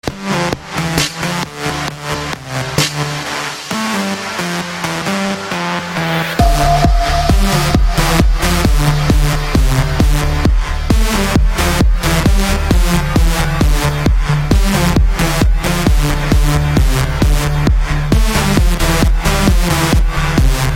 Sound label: Electro